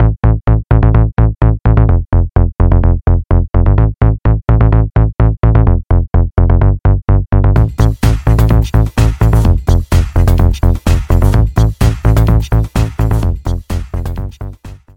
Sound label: bass